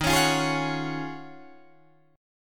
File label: Eb Minor 11th Eb down Guitar Drop D